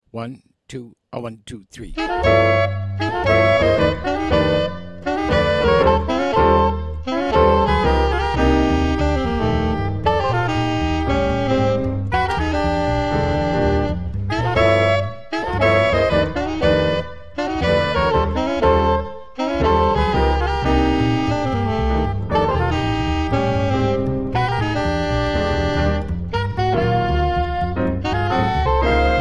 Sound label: minus Drums